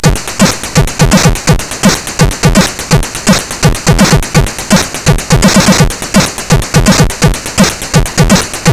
drum example, MOS6581R4; GoatTracker

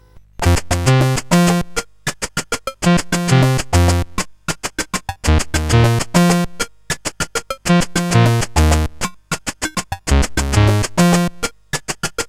Latin sid CSG8580R5

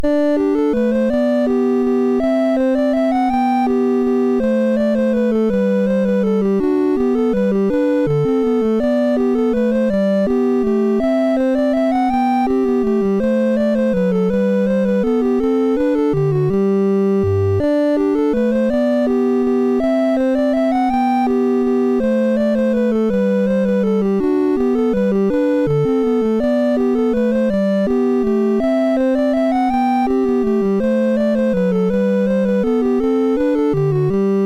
Minuet in G, MOS6581R3

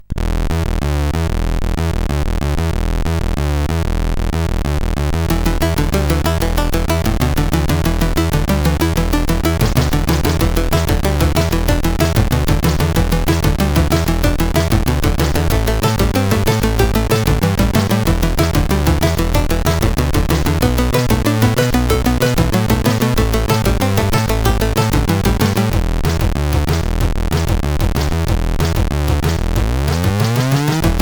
Scratch, CSG8580R5; Scratch n

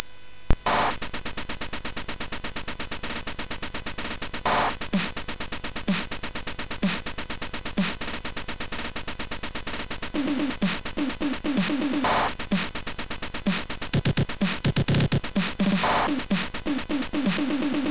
Rythmtraxx sid CSG8580R5; hvsc

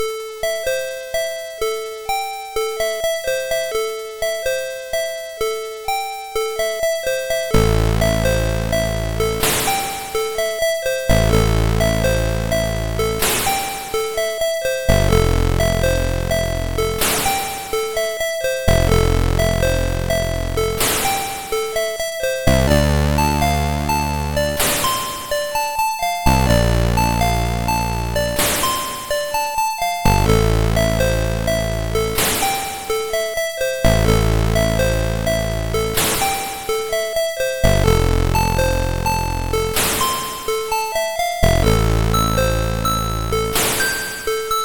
Dreamy Bells, sid MOS6581R2

